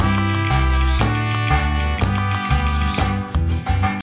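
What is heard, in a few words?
food world music inferno suspense no vox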